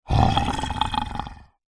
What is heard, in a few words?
horror monster short guttural growl